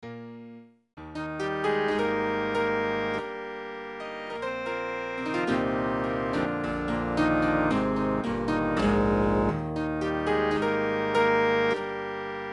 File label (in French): taxi, guitare